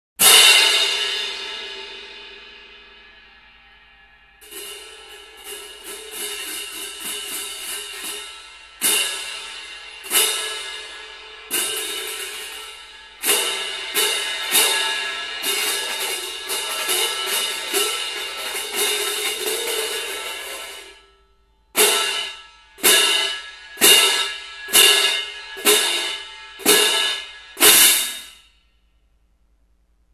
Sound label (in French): cymbales